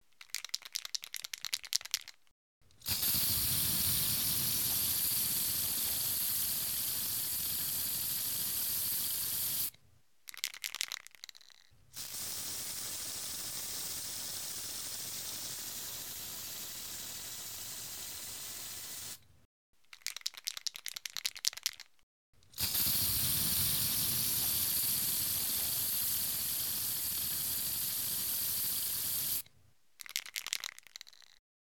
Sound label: Spray Paint